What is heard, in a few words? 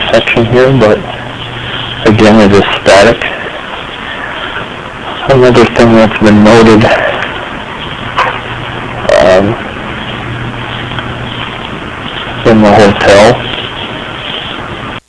Laugh and Cough; Menger; EVP